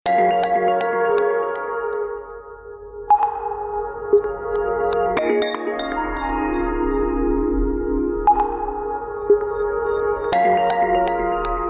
Peaceful, ringtones

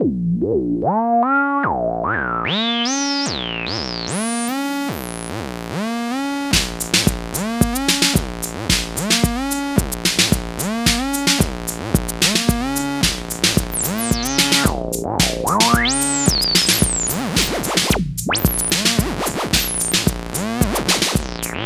alarm, ringtones; sound of oo website ringtones

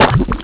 splash mud; Nokia; 5800 XpressMusic; Bounce Touch

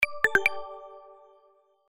Bell; TCL 10 SE

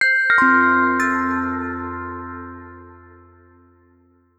shutdown; Samsung Galaxy Ace SHW-M240s

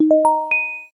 In Call Notification; LG Aristo 2 PLUS X212TAL

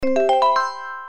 voice mail, phone tones; LG GD570 dLite

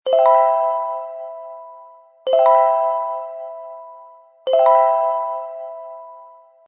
Ringtone; HTC; Freestyle; Shine

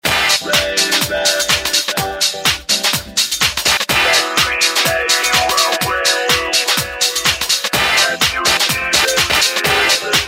Drum mix techno; Samsung SGH-Z510

